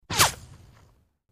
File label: Left To Right Various, bullet bys